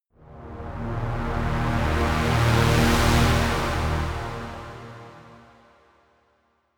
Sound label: drone heavy saw bass swell short